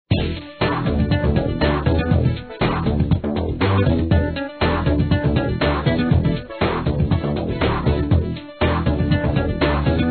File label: game music; traffic command